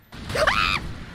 scream once again; IRyScream

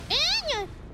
hope noises

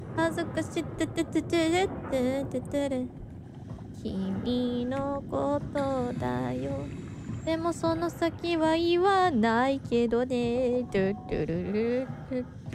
Singing; IRySinging

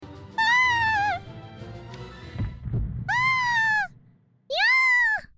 yaaah

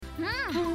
hm

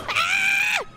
Scream; IRyScream

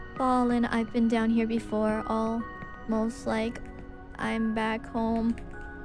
Singing sad

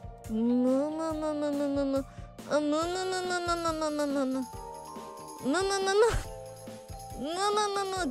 mumumumu

